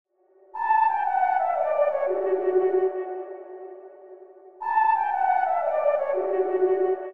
118 BPM B Min Flute; Melody; Trap; Loop Stems; Cymatics; 2022 Melody Collection; Trap Pt 1

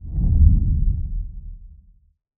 Fluid, whooshes

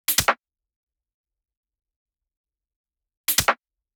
Future Bass 150bpm Percussion; Drums; EDM Drums; Drum Kits; Stems; Ghosthack; Ultimate EDM Drums; Drum Mega Bundle; Future Bass Kit 17

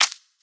Percussion; Cymatics; Light Free Sample Pack; Drum One Shots

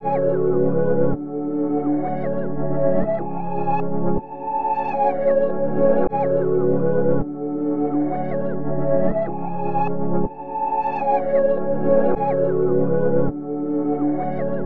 158 BPM F# Min Reverse; Loop Stems; Cymatics; 2022 Melody Collection; Time Travel

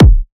Kick, Techno, One-Shots, Drums

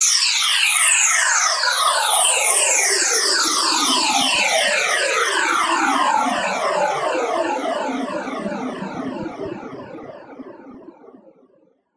SFX Down, Dubstep; Ghosthack; Dubstep Sound Arsenal

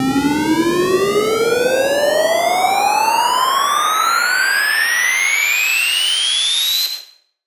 Sine Riser 140 BPM; FX; Cymatics; Light Free Sample Pack; Bonus Samples

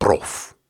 Grunts and Chants, Viking Vocal

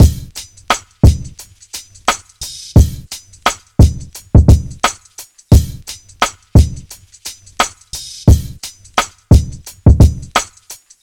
Drum Loop - 87 BPM, Boom Bap; Frozen; Cymatics - ANALOG EVOLUTION Beta

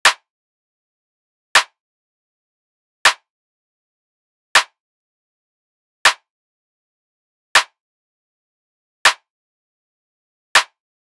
Clap 160, Trap Drums; Stems; Achieve Drum Kit

